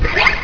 unsheath; Odyssey

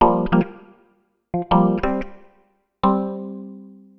Guit; FUNKIN&GROOVIN'

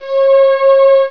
Metal Pad; Prince of Persia 2 Demo

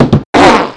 FALL; QUAKE2

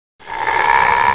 aaah

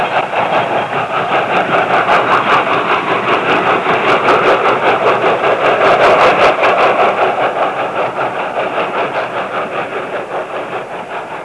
diesel train (leaving); Transportation; diesel train 3

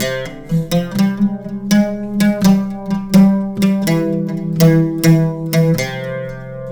SITAR ETHNO 125; eJay; Guitar

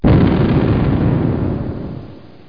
Sound Boom

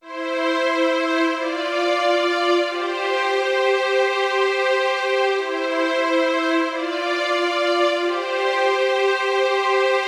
synth; Metal Zone

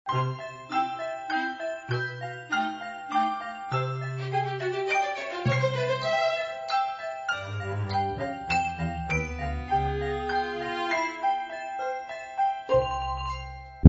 ballet

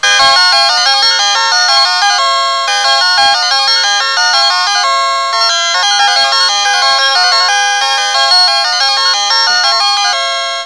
forest green music; charley cat xmas

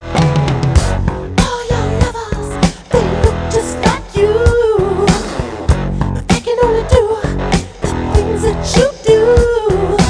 vocal

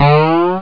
boing

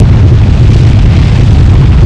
nuk quak; BigMax nº 14 Shattered Steel

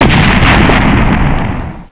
Crash Explosion; Shockwave Assault; Shockwave Demo

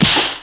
Fire Punch Impact; worms2

